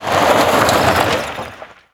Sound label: tire skid dirt short